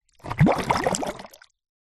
nature water short bubbles bubbling air blow into water in plastic pot